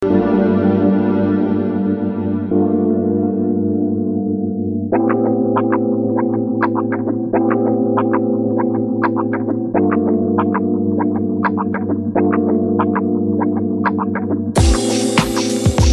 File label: Acid Jazz